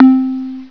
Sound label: Perc